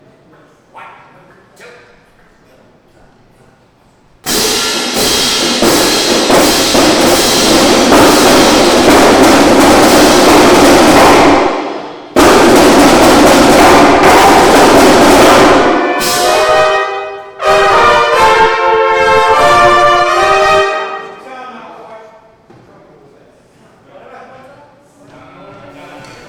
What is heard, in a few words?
Celebration Drum Intro, brass and ensemble